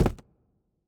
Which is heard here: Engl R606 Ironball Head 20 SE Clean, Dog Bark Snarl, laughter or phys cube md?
phys cube md